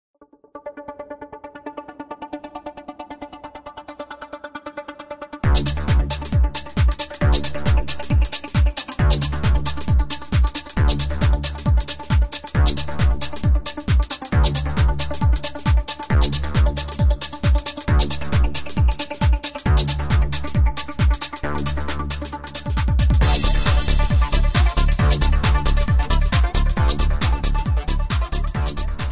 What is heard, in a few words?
Dance